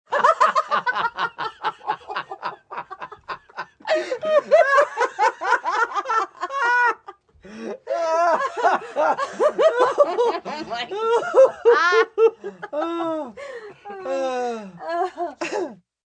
Group Laugh Belly, Laughing, group of 3-5 (30-something) friends, enthusiastic, out of breath; (human voice); VoiceBox